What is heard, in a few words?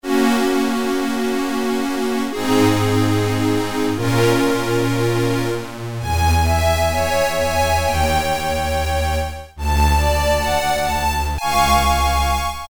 Square Wave Ensemble, Korg Poly-61M